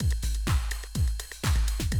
Classic DrumLoops, 125

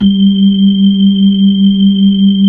B-3, Hollow; Keyboards of The 60's and 70's

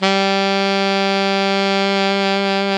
SAX ALTO MF; SAXOPHONES; Roland - LA Composer vol. 1

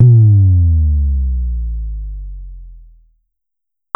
Pollard Syndrum Sub

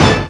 TECHNO; X-Static Goldmine 1

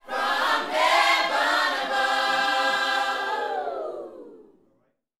Vocal, Gospel, PHRASE; Spectrasonics

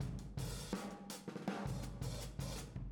Boogaloo Fill Room M, 82 BPM, Drums; Sonic Foundry (Sony Creative Software) - Drums from the Big Room The Mixes

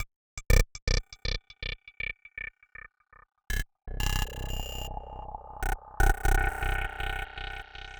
Click Trick, Modular Electronica; Beats; Sonic Foundry (Sony Creative Software)

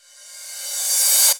Reverse Cym, Cymbals

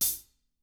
HT EDGE; ILIO; Double Platinum Drums 1; THIN A HAT

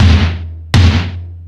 Toms, Drum Machines E-MU Pro-Cussion

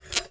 Prepared Drums

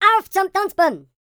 german, YODEL YELL; ILIO; Vocal Planet

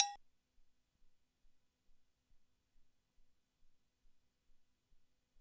Cwbell, ELKA X1000

